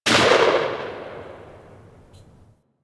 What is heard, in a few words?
Uzi Tail; Weapons; Warframe; Tenno